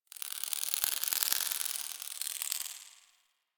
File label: Foliage Insect; Ambience; Props; Warframe; Duviri